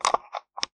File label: Taxi Meter